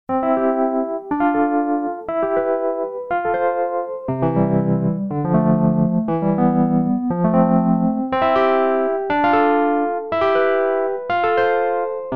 WURLYBIRD 140B; CHERRY AUDIO

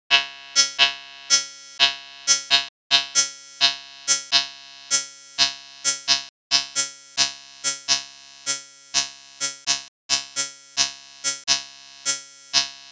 Minibrute V vs Microbrute

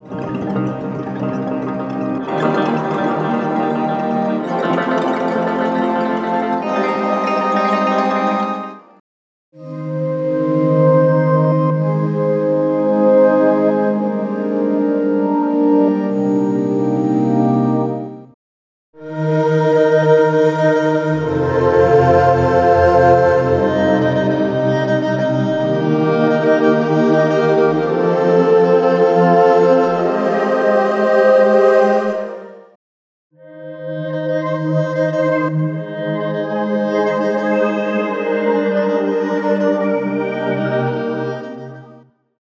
Pads Presets; Yangtze

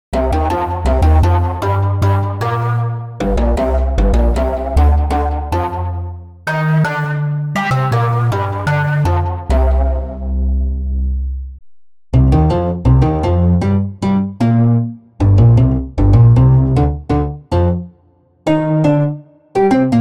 Bass Presets; Yangtze

